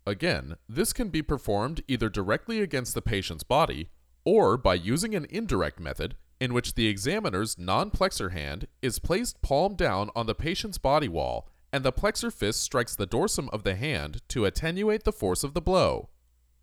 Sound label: Percussion